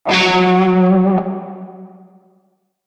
Shawzin Flourish Oct Two F Sharp; Music; Warframe; Narmer Shawzin

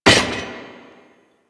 debris metal stone; ambience; Warframe; Entrati

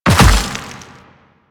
Land Dirt, footsteps; Warframe; The New War; Kahl; Quests

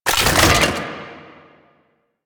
Key Pickup; Assassinate

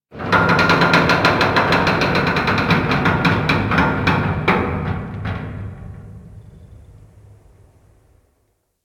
Metal Creak Slow; Ambience; Interior; Warframe; Orokin Derelict

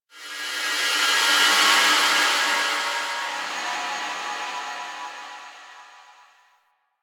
Mid Texture

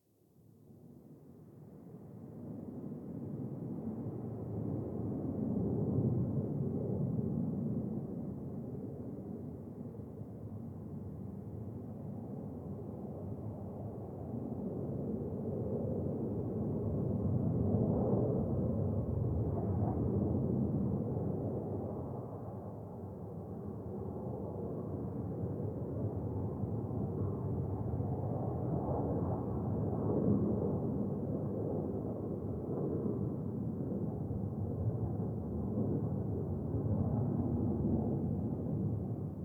Distant Ambient Fighter Jet; Ambience; Warframe; Nineteen Ninety Nine

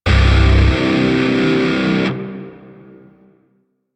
Shawzin Maj Chord D; Grineer; DE; Warframe

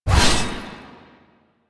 Blade Swipe; Melee; Dagath

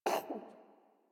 Kitten Cough Hairball; Enemies; Warframe; Catbrow

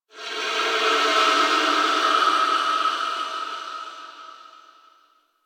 Whisper Air Draft; Ambience; Warframe; The New War; Quests; Zariman

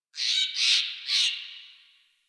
Tree Critters Happy Calm; Warframe; Duviri

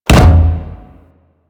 Pilot Breakout Hit One; Warframe; Amalgam Carrus

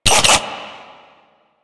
flying robot engine stop; Warframe; Veilbreaker; Quests; Ambience; Kahl

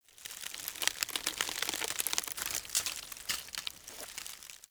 Tree Root Bark Crackle Slow; Ambience; Dungeons; Under City

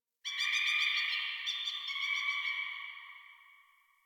Tree Distant Critters Happy Calm; Ambience; Warframe; Duviri

